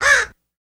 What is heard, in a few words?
Crow Caw Single